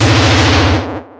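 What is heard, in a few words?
Battle Skill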